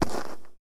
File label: dirt, footsteps